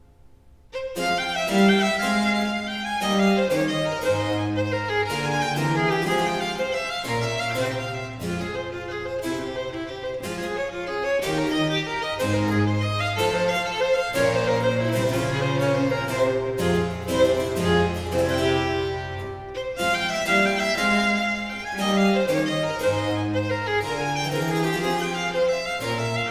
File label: Sonate 5 in G minor 5. Giga, allegro; Arcangelo Corelli; Corelli - Complete Works; Klassika